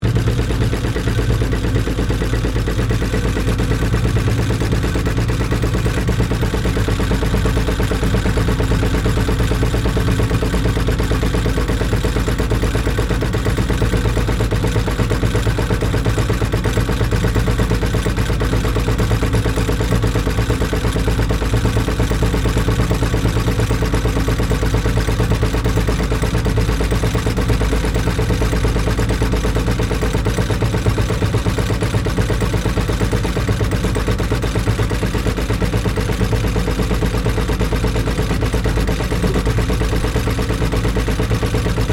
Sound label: Boat Small Engine Inboard Stereo, Inboard boat engine running badly